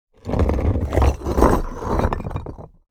Asphalt concrete block dragged on bricks with debris